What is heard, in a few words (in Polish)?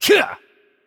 Attack Heavy; pl PL; bloom minigame